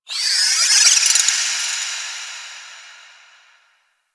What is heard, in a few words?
Ocean Underwater Critter Small